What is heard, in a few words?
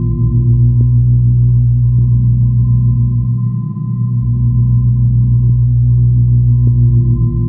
shield loop, machines; combine; Half Life Model Pack; Half Life 2